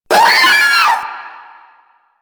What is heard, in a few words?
Proto Infested vocal short attack